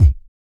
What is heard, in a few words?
BOOGA BRETH, Vocal Planet Blues & Soul; ILIO